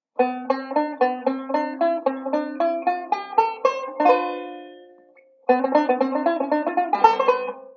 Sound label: ii V Pattern, banjo